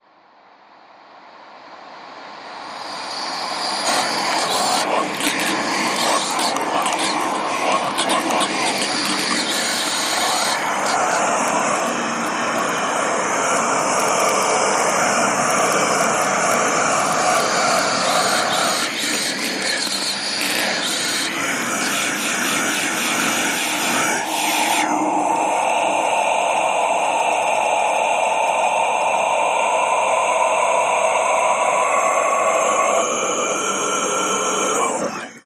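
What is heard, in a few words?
creature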